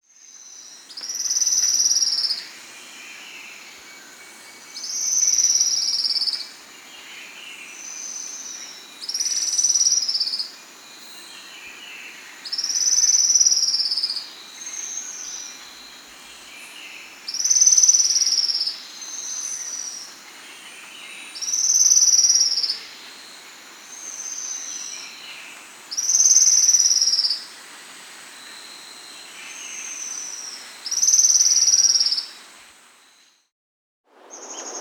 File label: Foothill Antwren (Myrmotherula Spodionota), Andean Birds; S. America